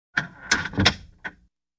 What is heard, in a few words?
Creaky Door close